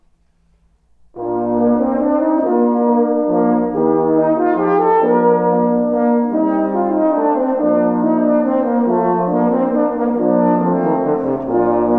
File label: Menuet